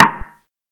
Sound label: drum hit finish